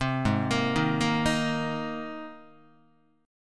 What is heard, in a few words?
G sharp Major Add 9th G sharp, Guitar; G#add9 arpeggiated; JGuitar